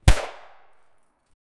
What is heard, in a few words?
a shotgun shot 12 caliber